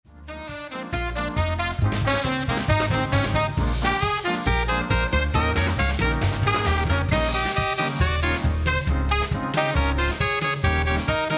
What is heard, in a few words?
Latin Blues